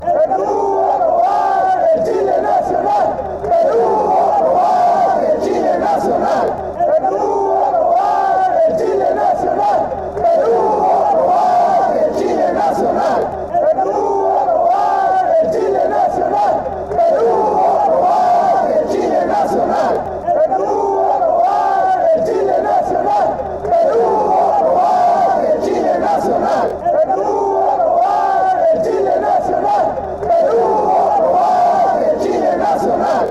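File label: fanchants, football chants; el chile nacional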